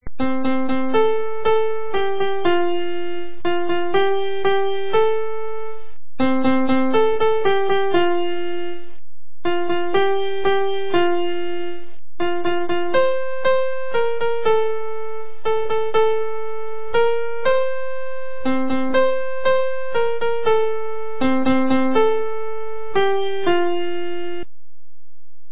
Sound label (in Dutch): liedje piano